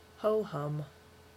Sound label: ho-hum